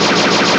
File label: drone fire continious